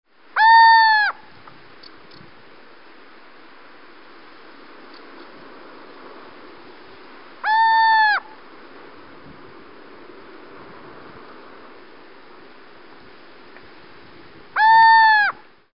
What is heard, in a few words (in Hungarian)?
kuvik